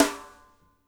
SHUFF SN, SNARES; Spectrasonics; Burning Grooves

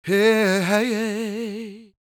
CROON, Soul

